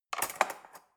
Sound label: cutlery fork drawer handle scrape soft